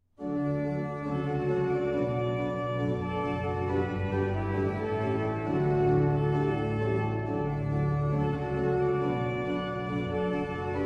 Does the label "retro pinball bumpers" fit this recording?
No